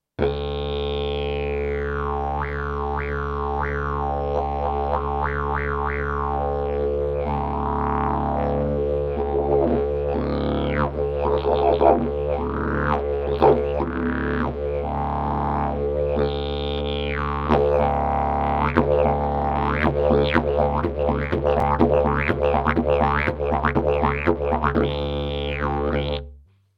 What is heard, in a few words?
didgeridoo; olse party